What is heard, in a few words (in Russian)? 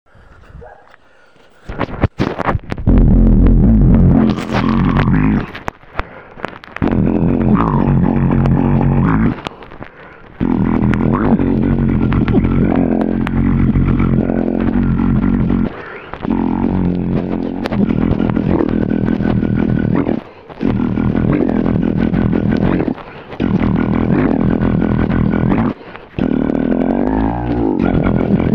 ббокс низ